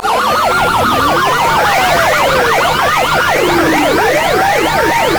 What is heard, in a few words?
vi r dash